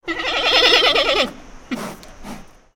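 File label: Pets Bear Panda Ecstatic; creatures; Lego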